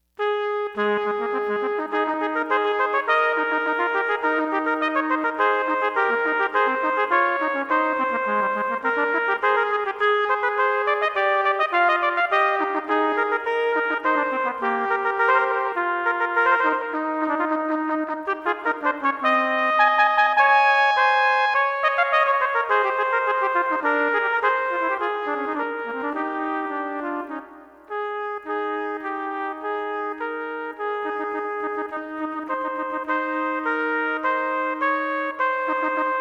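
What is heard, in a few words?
O Come 2 trumpet; Trpt Duets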